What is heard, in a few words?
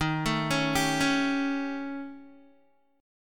D sharp 7th Flat 5th, D sharp, down, Guitar; arpeggiated; JGuitar